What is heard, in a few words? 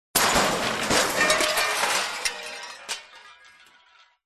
crashes junk various; Audio Mangler